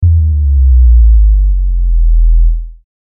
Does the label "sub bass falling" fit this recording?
Yes